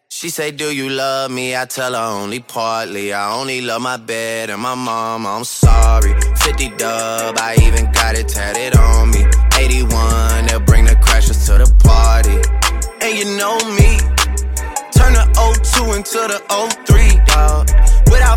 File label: english song tone ringtone